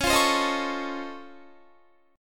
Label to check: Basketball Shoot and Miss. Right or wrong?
Wrong